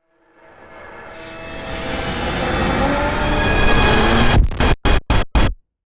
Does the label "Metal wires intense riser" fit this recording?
Yes